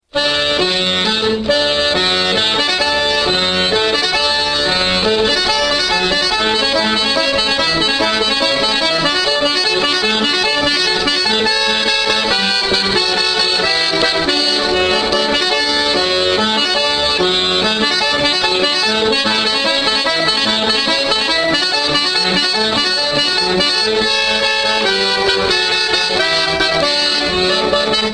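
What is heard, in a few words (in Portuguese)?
acordeon; instrumentos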